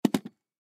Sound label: Camera Set Down, Camera Set Down Wood Digital; Electronics; Technology; Blastwave